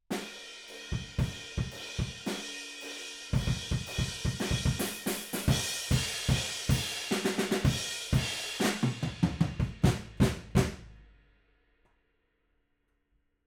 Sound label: Room